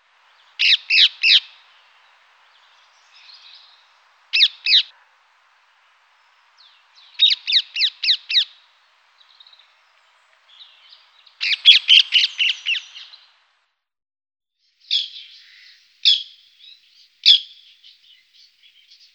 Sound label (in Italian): parrocchetto dal collare